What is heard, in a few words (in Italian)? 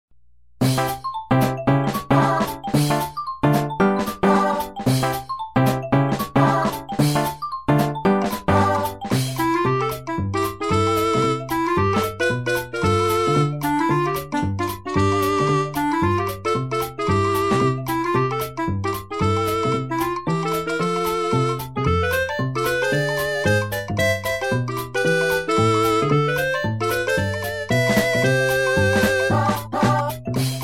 Musica livello acrobatico; NSMB